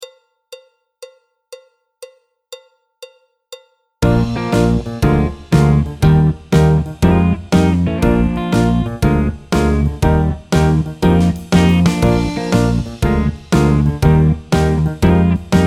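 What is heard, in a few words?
backing track, Jazz; clarinet, flute, tenor; Jazz Syllabus Level 1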